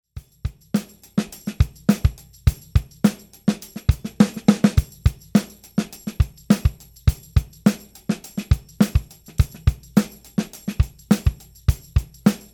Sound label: 80s pop